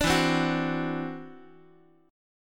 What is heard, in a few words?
E 7th Suspended 2nd Sharp 5th E down Guitar 4A 4E 5A 5D 5G sharp 6C sharp; strummed; chord; JGuitar